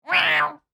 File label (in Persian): صدای گربه عصبانی; انواع صدای گربه و معنای آن